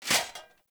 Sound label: Foley Dual Dagger Taunt; Raider; Sinecure; Enemy